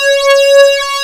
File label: keytar; jazz jackrabbit 2